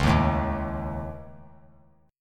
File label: C sharp Suspended 2nd Sharp 5th C sharp; C#sus2#5; strummed; JGuitar